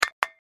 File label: Foley Aerosol Can Set Down; Set Downs